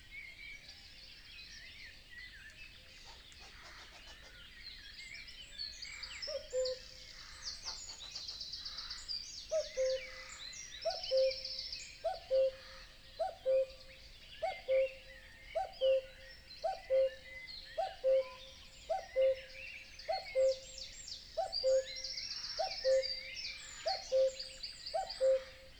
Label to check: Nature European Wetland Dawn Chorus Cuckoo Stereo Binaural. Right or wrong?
Right